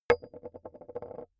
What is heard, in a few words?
metal impact wood light rolling